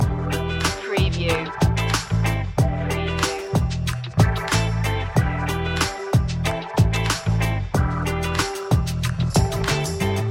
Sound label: cool funky groove loop